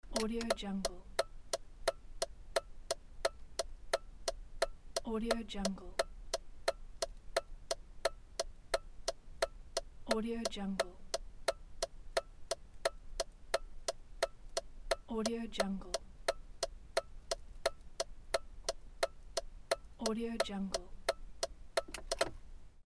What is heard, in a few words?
car turn signal; mega-loop